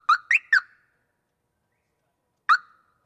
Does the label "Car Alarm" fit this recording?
Yes